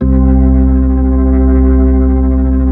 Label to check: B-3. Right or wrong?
Right